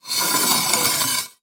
Wood Scrape Slide; Sound Effects Bible

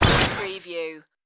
robot malfunction burst

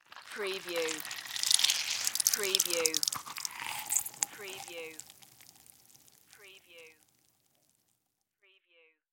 Cantaloupe Squeeze

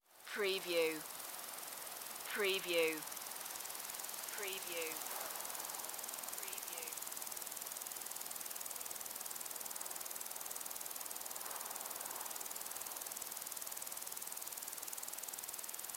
Crickets and insects in windy long grass; beanstalk audio

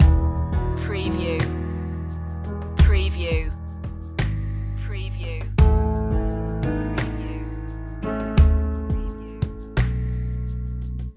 beauty and calm

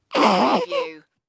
Baby Groan

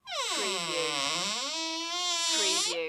Door Squeak Long

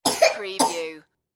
Child Cough

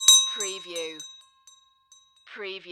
Hand Bell Hit Ring

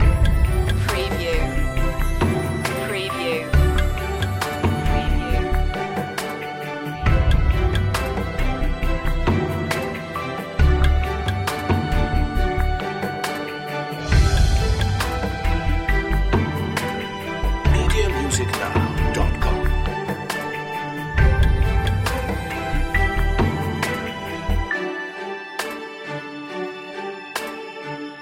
watercolours loop

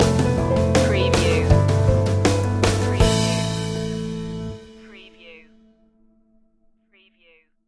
building success stinger

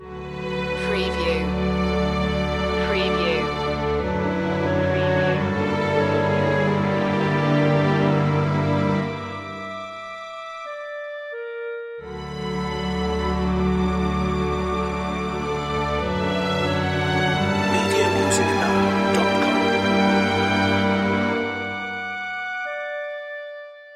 classic romantic loop

